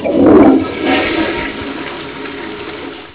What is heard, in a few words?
flush toilet